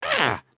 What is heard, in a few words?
Angry, emotes